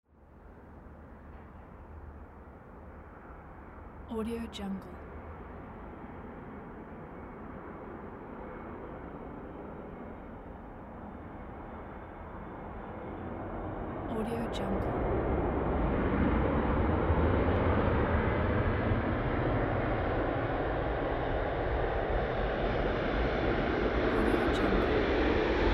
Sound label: passenger airplane landing